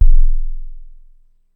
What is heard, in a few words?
Kick, Ensoniq ASR-X Pro